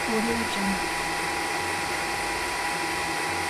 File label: scifi eerie malfunctioning ventilation system loop